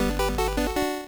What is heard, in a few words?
appraiser tooth get sfx